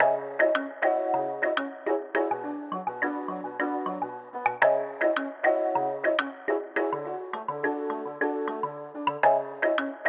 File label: mallets, 104 BPM A Maj; Istanbul